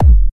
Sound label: bassdrum; lmms; drums